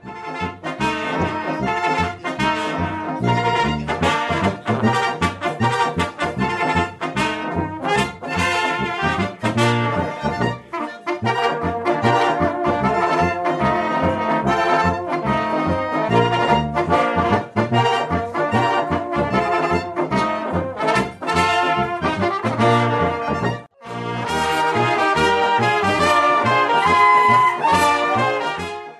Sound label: Samsung Cool Polka